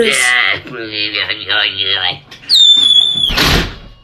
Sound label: alien fireworks hit